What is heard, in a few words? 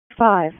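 EMS ALERT, radio; wake; p25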